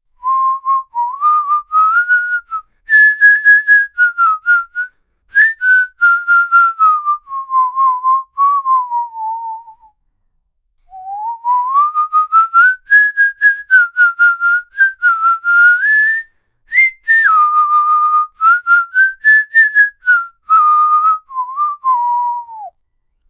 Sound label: single person, melodic